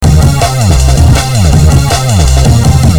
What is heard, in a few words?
depressing rock music